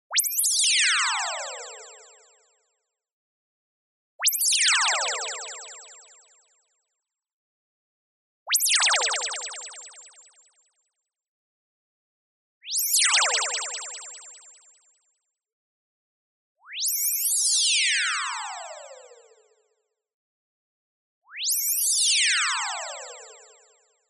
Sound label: tonal, sine sweep; boom library; Anime Essentials